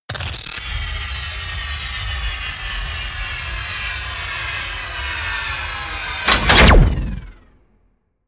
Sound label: promo pad